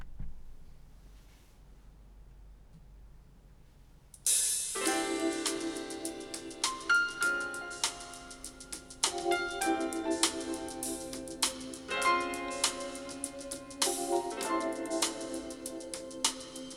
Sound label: original jazz